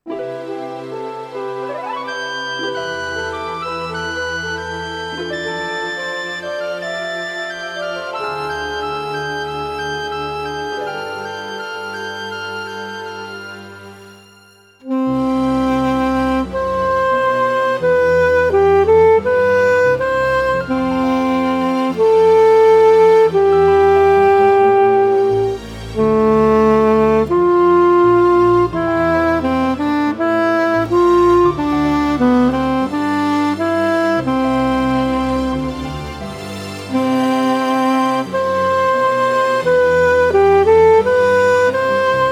sax

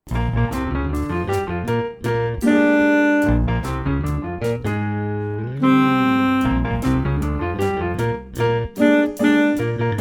sax mi b

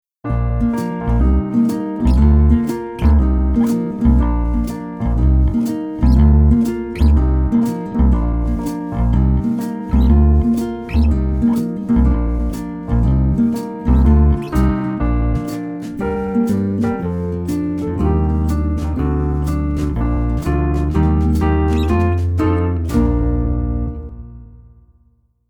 saxophone; mib

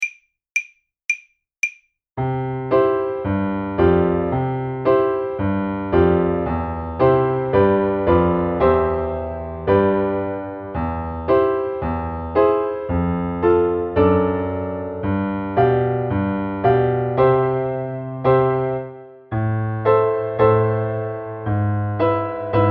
marche kohler acct ut, basson

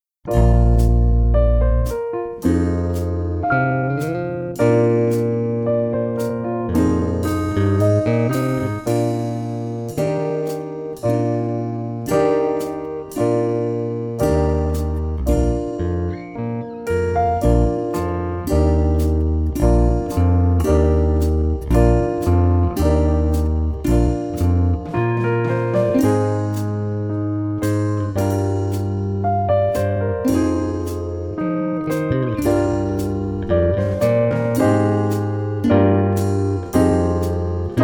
saxophone; mib

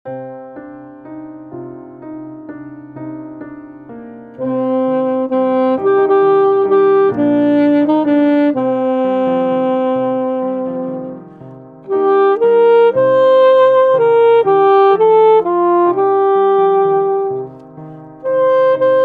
scarborough sax

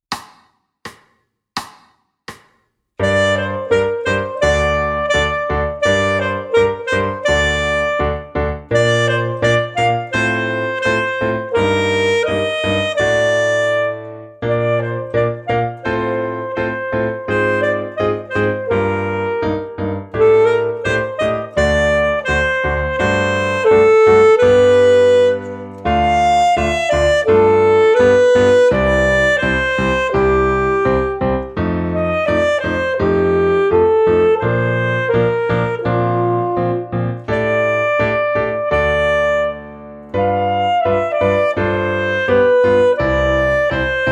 multi sax; multicolores

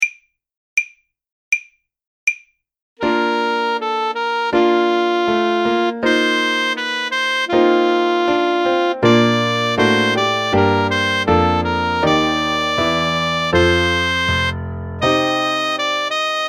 en vacances sax

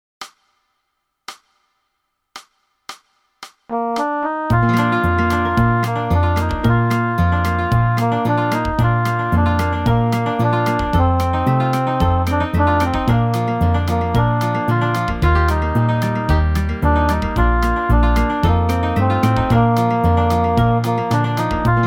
saxophone, When the Saints

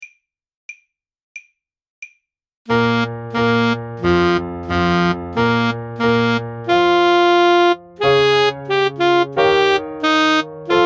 cossack dance sax

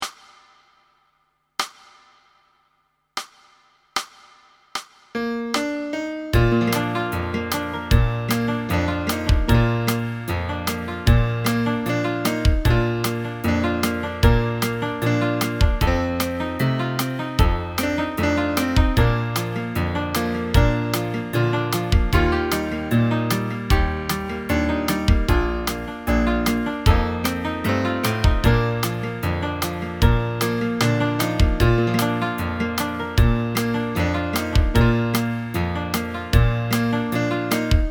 saints sax solo; when the saints

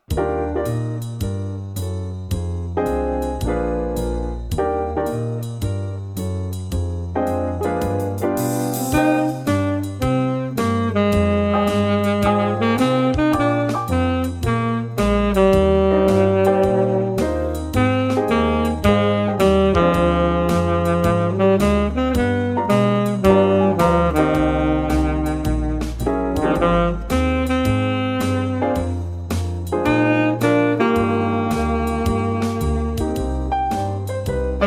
sax grave

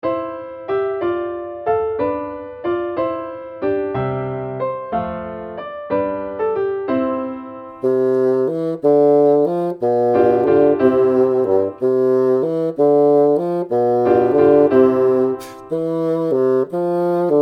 barcarolle hilling ut 92, basson

